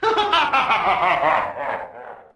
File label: laugh; pepperman